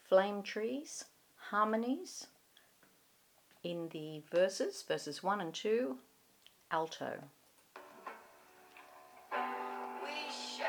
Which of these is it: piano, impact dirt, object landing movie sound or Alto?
Alto